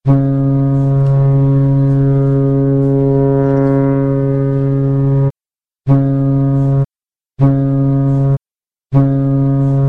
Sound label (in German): lang kurz kurz kurz, schall